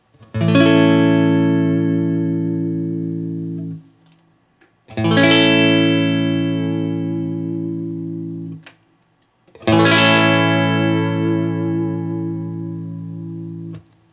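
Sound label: Guild X500T A Barre, guitar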